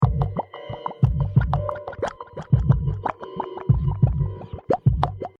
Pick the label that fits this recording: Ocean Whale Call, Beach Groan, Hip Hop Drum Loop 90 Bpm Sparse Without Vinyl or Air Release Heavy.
Hip Hop Drum Loop 90 Bpm Sparse Without Vinyl